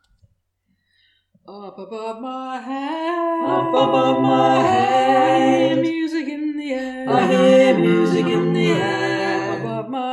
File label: bass low